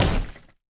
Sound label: Object Amplifier Hit